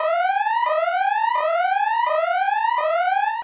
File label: Map Siren Flash